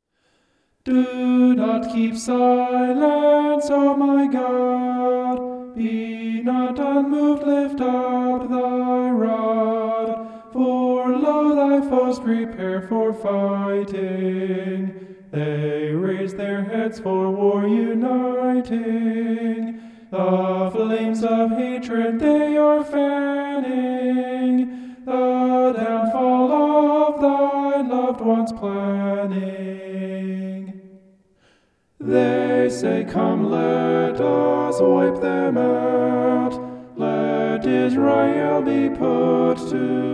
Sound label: vocal homoph; psalm 83